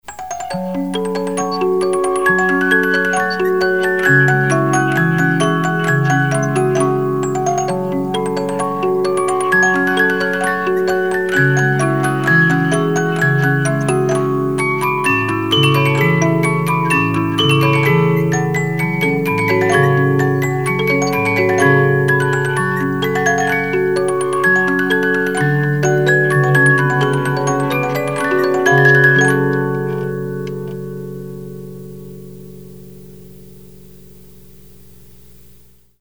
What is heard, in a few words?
music boxes, 72-Note Movements; Turkish March